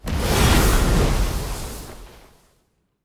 Monster; Zenless Zone Zero

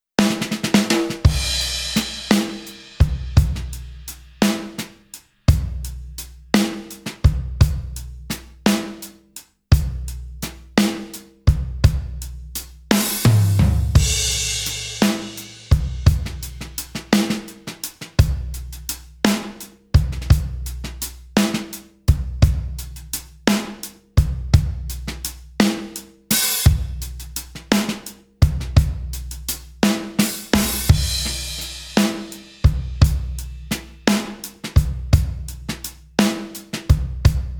drums, 85BPM; Pony Fan Music Remix Stems

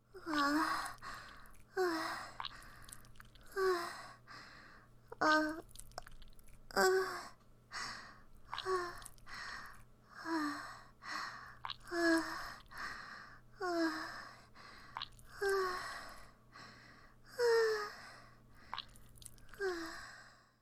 F4A Just 20 Seconds Of Moans, Moaning; SUPER Short Audio

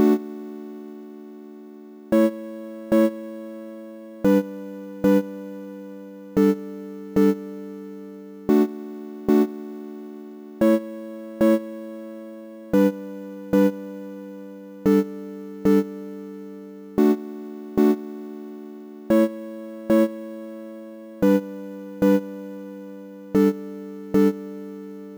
mello chord hits, 113BPM

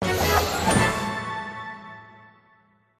Quest Complete!; MLP Friendship is Magic (Mobile Game); My Little Pony OST Repository

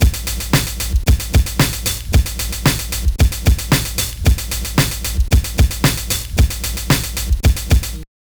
drums DRY; 113BPM; Pony Fan Music Remix Stems

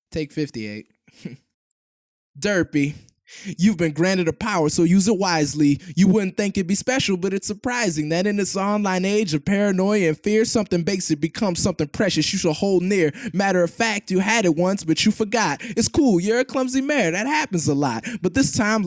vocals only 97.5BPM; Acapellas

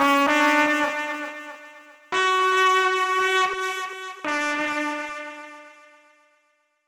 trumpet

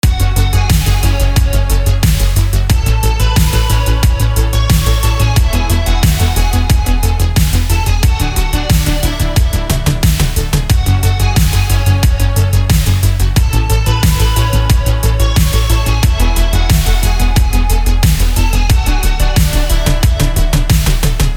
synthwave attempt

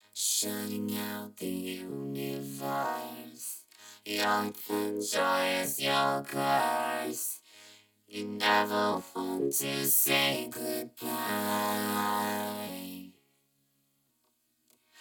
verse backing; 128BPM

